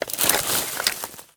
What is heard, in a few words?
Bodyfall Weeds; SFX Kit; Bodyfalls